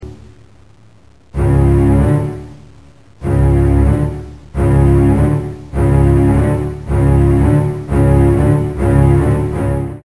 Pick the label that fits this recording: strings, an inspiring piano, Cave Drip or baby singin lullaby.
strings